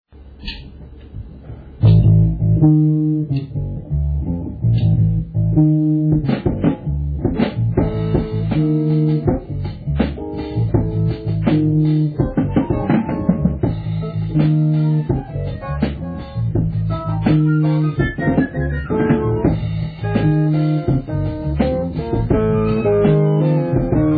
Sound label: lofi purses